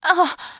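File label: moan, female; Interactions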